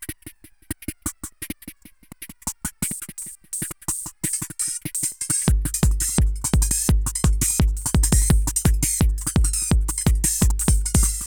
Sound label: ECHO, Tekkno LOOPS 170; ECHO PARK; Masterbits - Tekkno Trax